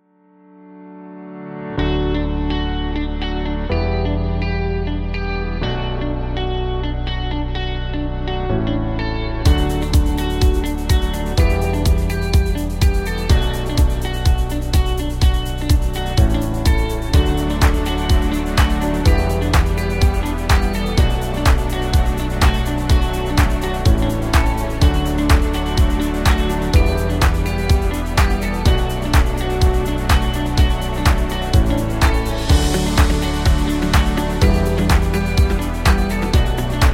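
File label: Rhythm of Life, happy corporate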